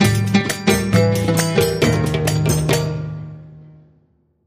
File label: Good Oud, Hit